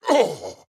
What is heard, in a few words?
Hurt; Black Adam